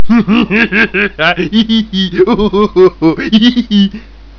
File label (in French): Rire